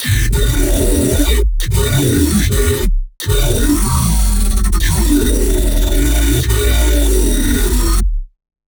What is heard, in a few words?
Aqua Hits Stereo, Dubstep Bass Hits; Ghosthack; Advent Calendar 2017